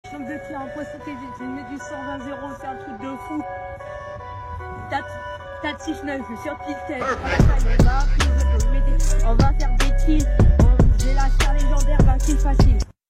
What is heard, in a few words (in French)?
rap de cannette